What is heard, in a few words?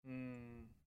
hmmmm